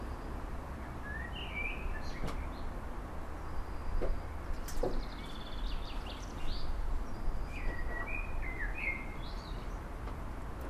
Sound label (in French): oiseaux